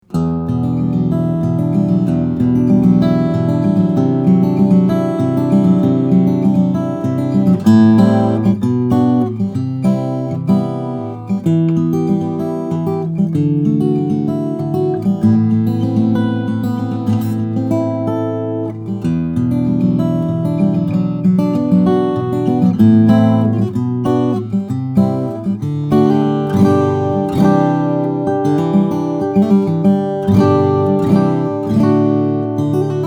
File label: de jonge OMC Indian/Cedar, steel string guitars flattop; Joshia de Jonge OMC Indian/Cedar